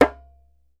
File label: DJEMBE, African Perc